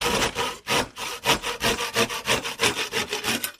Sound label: handsaw sawing